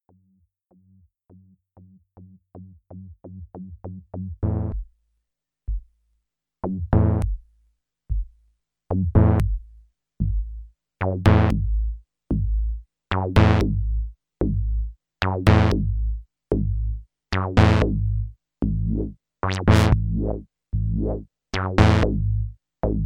kobol fast env

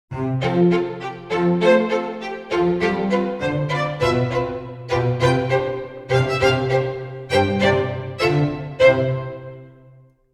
Chamber Strings Short Notes

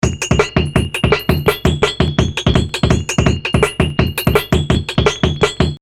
Loop FX

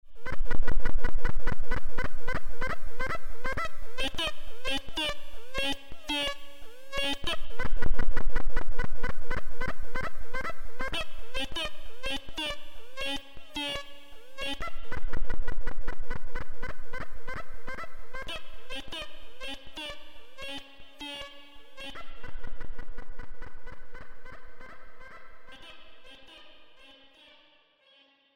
Serge Crocodile SSG Birds

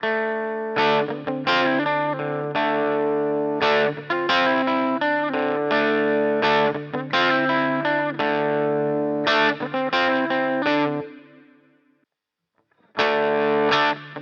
Harley Benton DNAfx GiT Vox Kompessor off on

